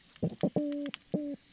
Opsanus beta Complex Call, Recording contains multiple sounds